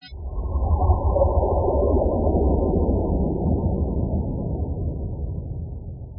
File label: sound bell